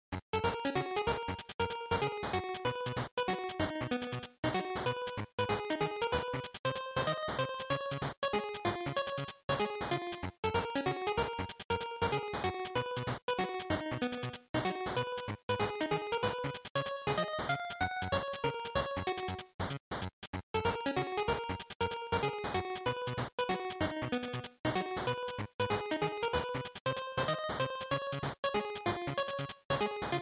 General Game Over, bgm

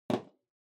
Power Strip Put

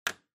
Kitchen Timer Magnet Stick

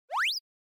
Anime Motion Slow Short

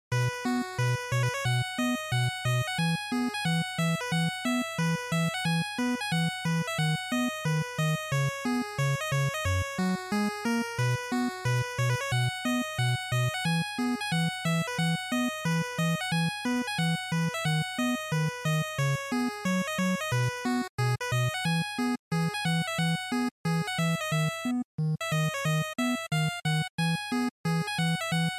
NES Shooter Title

